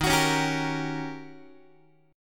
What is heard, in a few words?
Eb 7th Sharp 9th Eb, Guitar; strummed; x,11,9,11,11,9; 4A,4E,5A,5D,5Gsharp,6Csharp